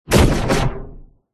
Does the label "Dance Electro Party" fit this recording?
No